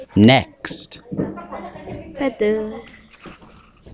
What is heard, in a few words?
pad